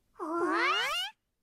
ウグイスのさえずり; 声マネキング; 声マネキング対決; フブみこさん; ホロライブ; さくらみこ

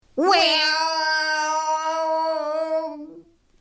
ビヨ～ン

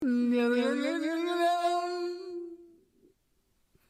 中華風の音色

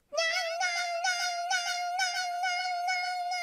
踏切; 平交道; 声マネキング; 声マネキング対決; フブみこさん; ホロライブ; さくらみこ

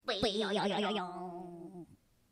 ビヨヨヨ～ン; 彈彈彈～; 声マネキング; 声マネキング対決; フブみこさん; ホロライブ; さくらみこ

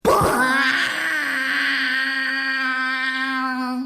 ドラを鳴らす

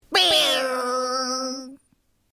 ビヨヨヨ～ン

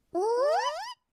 伸びる; 延伸; 声マネキング; 声マネキング対決; フブみこさん; ホロライブ; さくらみこ

